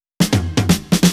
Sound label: Fill 128 BPM; Vengeance Samples; VEH1 Loops